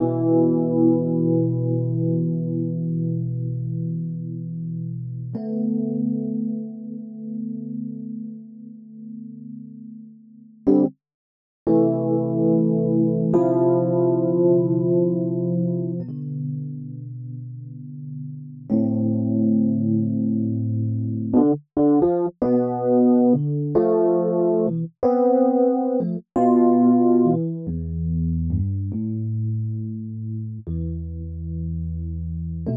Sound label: Em90 PIANO dry